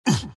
grunts; dota; skeleton king

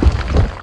magnetize debris; weapons; dota; earth spirit

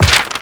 page turn, book; ui

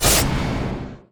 attack arc; spectre

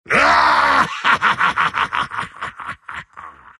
troll laugh; dota; troll warlord

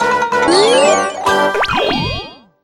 hi5 success; poogie; dota; mh event

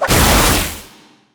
lightning strike; razor

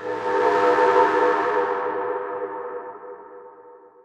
echo layer; weapons; dota; earthshaker

